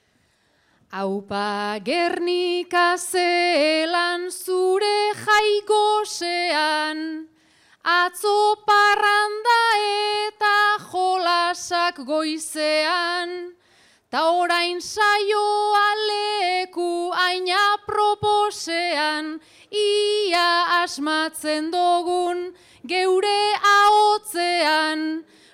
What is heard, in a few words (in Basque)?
agurra, bertsoa; gernika-lumo